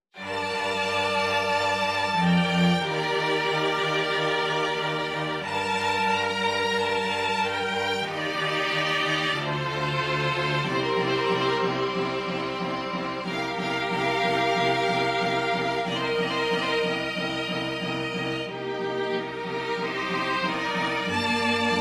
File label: STRING ORCHESTRA